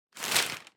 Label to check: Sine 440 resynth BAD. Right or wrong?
Wrong